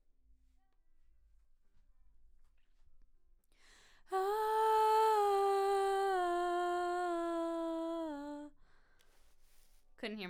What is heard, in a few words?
BG Vox